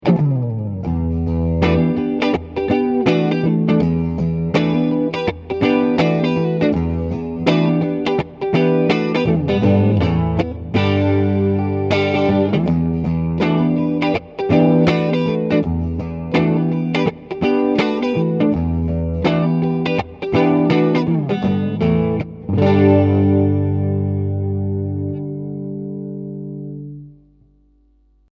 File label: Neck Humb